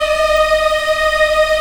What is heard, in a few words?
P D#5, CHOIR; Spectrasonics; Heart of Africa 1; CHOIR MMS